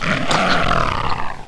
zombie die; shamblie; npc

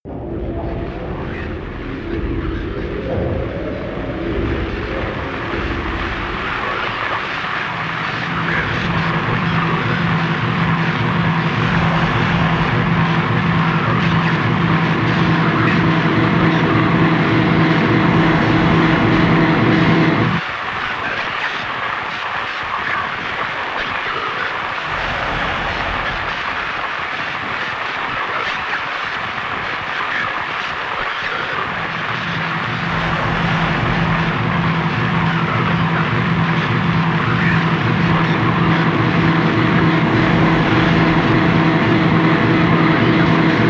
interference; deathlegends; halloween

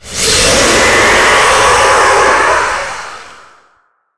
spider dying

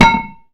metal

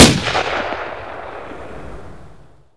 svd; npc

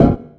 epic metal soft

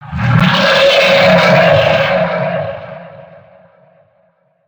swamp growl, creature; stalker

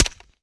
mp5, weapons; npc

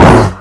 fire, weapons

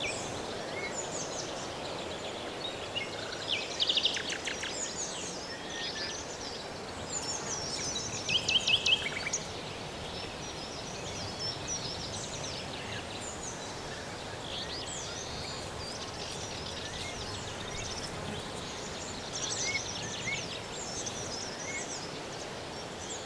forest day

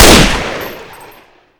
scar fire, weapons

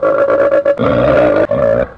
hunter laugh; ministrider; npc

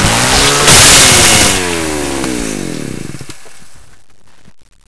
chainsawed player; boss; sawer

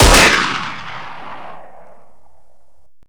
usp unsil, weapons

